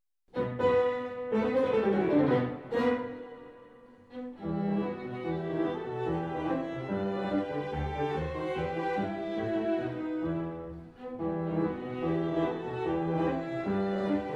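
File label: piano trio; reber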